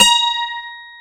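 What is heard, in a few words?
A#1, MINI 12 GUITAR; AKAI S-Series CD-ROM Sound Library VOL-7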